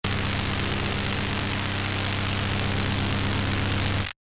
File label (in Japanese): 電流